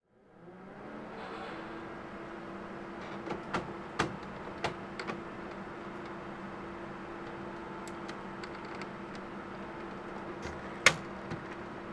office printer hp lasterjet cp3525dn switch on initialise